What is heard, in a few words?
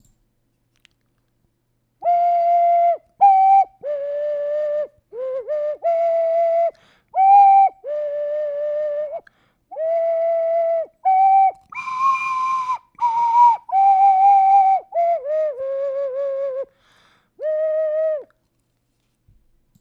shitty flute